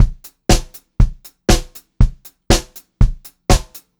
120 pop beat, Pop-Rock Drumloops

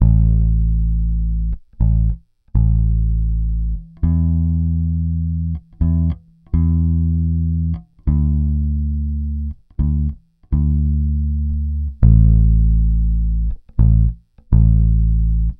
Pop, Bass

